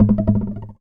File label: Classical Guitar Bounce; Classical Guitar Extended Technique Stereo